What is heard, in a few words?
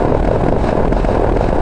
snow, surfaces